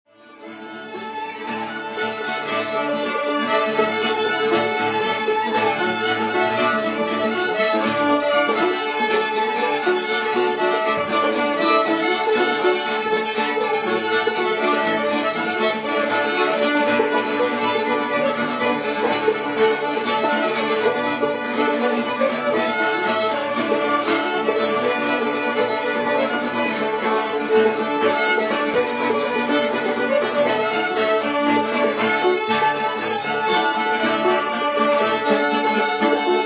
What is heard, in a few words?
Fiddle, Banjo, Bouzouki; Rockingham Cindy; Old-Time JAM